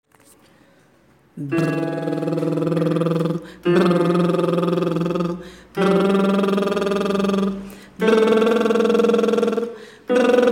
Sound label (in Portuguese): Escala nota a nota para mulheres treinarem com brrr ou trrr. De E2 ate G4; pega desde contraltos